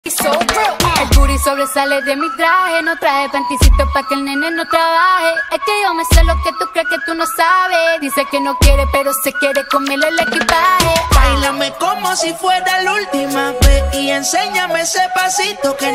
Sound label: Ringtone